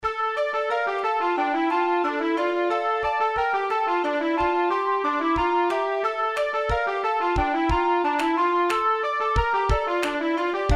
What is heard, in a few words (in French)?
Ridée